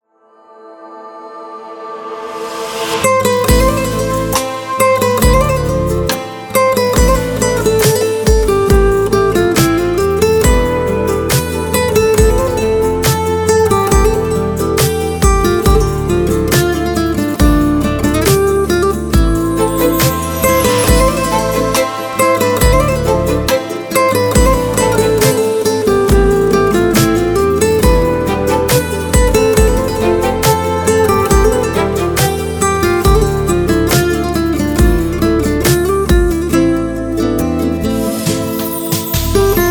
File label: Guitar